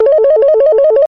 Panasonic Ring

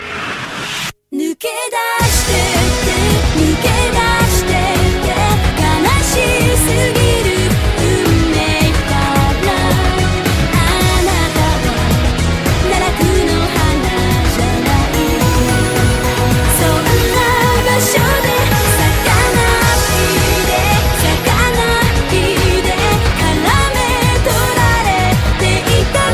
Opening Song

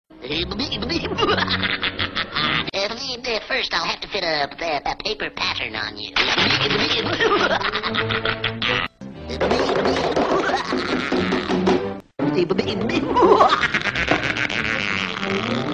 Evil Laughs; Porky

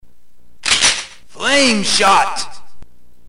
Flame Shot; MS2

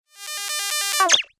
Smack Smack

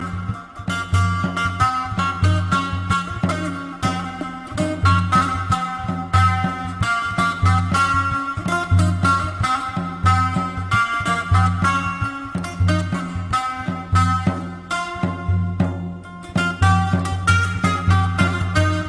Sitar

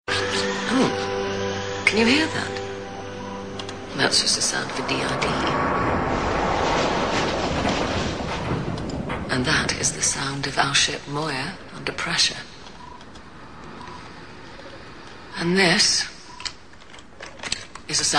baby Sounds; Farscape

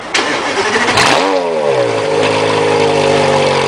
Carrera Gt Startup

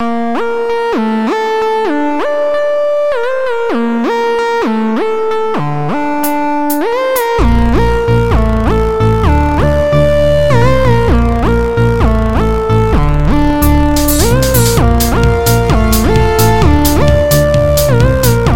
Melody

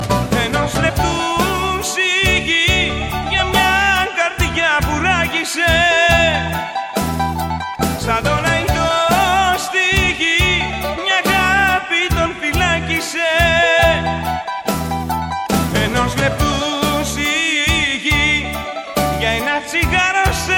Sigh

